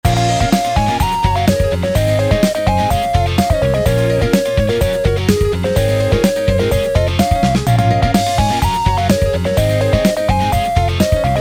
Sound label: Drums atama fill